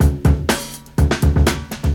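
124 bpm drum loop sample d key, kick tuned to the D note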